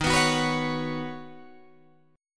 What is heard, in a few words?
D sharp Minor 7th Sharp 5th, Guitar, Drop D